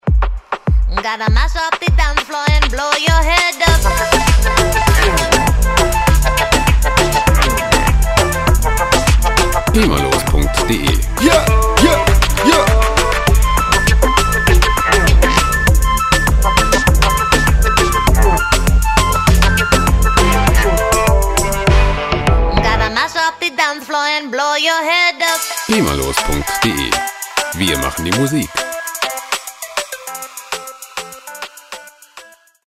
moombah dutch

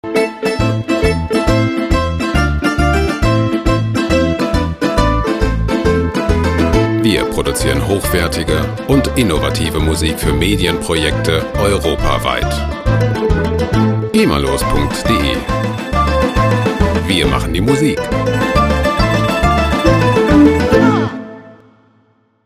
tarantella napoletana

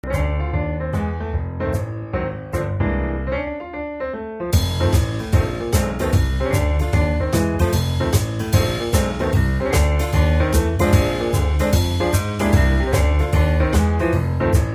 boogie beat swing club

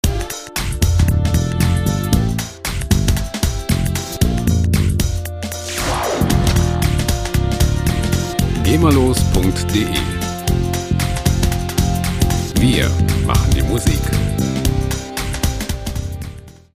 sax

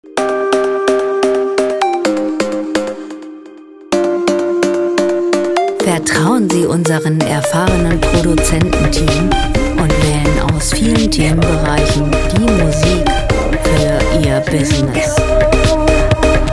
miami edm

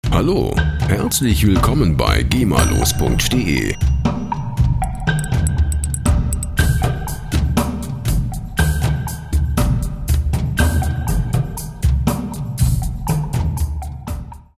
cinematic percussions

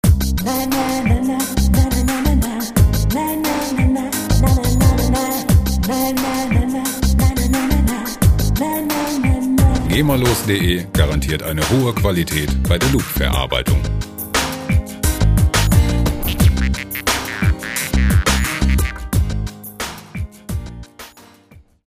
my voice

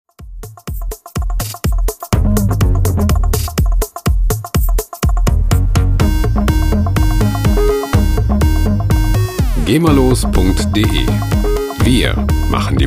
jungle beatz

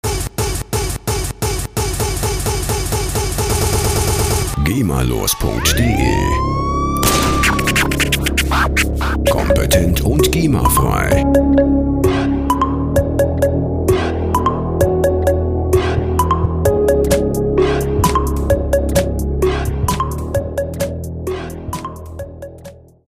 gangster trap

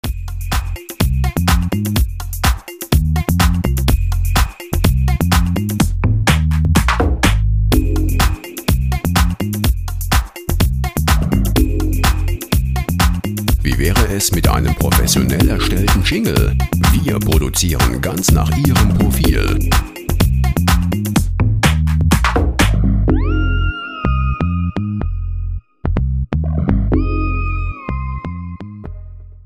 house bed

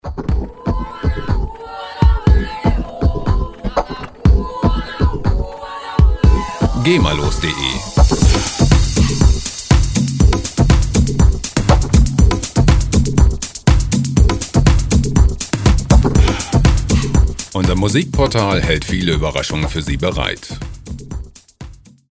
afro minimal

